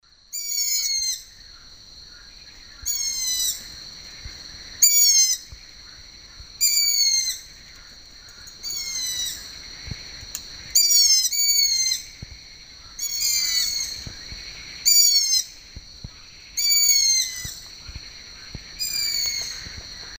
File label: asio otus